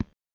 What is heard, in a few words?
footstep